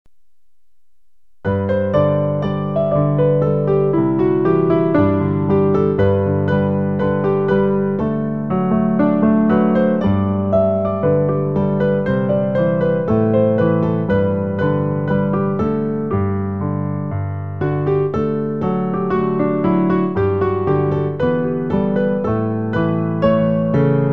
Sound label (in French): piano